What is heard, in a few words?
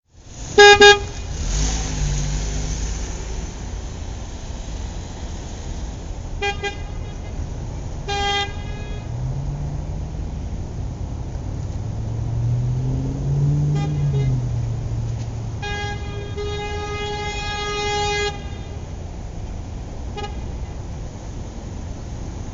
car horn